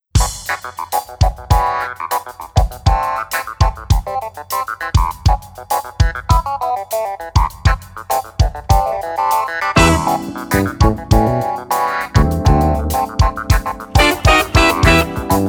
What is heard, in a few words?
Boom Tune, drums